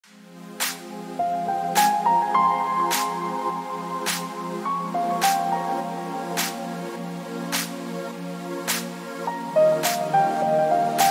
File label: piano